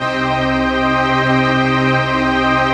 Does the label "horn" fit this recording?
No